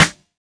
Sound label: sizzly snare drum sample E key; snare drum samples